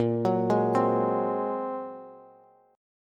A sharp 7th Suspended 2nd Sharp 5th, Bass, Bass Standard; arpeggiated; JGuitar